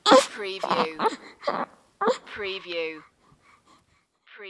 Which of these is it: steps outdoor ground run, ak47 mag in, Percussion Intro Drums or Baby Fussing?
Baby Fussing